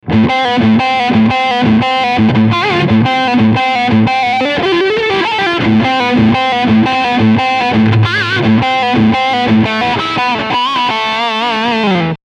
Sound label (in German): Leadsound Steg HB